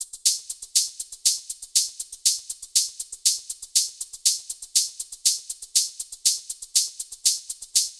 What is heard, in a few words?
Punch, 120, Perc